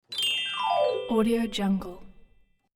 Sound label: clavichord magical glissando down; hollywoodedge